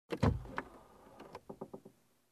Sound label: Open Car Door